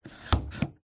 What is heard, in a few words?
vehicles car door locked handle open attempt ext